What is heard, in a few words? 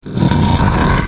TombStone Sliding; kids-tetris; Haunted